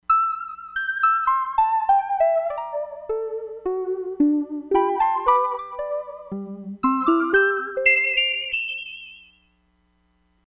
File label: HARPO, Crumar Bit 99; Factory Programs